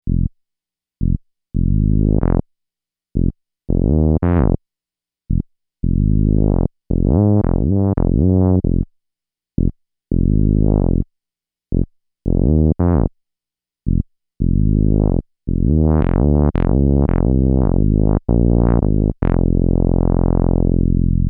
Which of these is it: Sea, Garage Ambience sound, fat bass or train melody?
fat bass